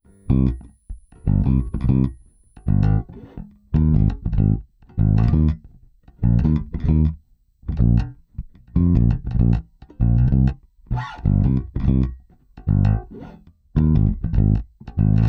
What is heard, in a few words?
bass cable solo; qac 222g